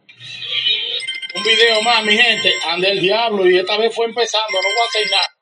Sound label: ringing